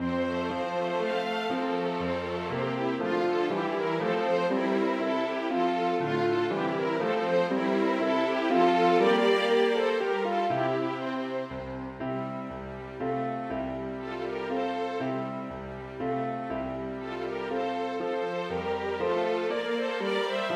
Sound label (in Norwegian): Menuet for to fioliner, cello og piano